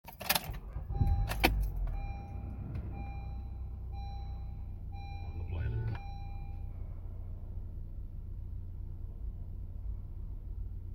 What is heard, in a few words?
2017 accord lx alert tone tone